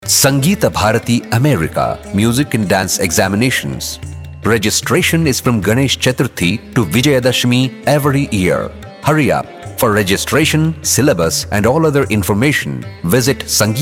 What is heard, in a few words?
Examination Jingle; Sangeeta Bharati